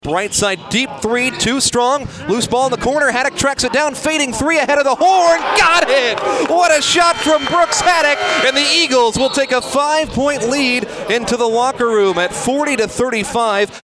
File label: buzzer beater